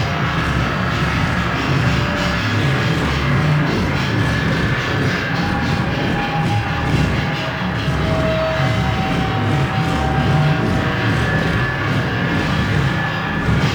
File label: Connector Loop; Warframe; Entrati; Ambience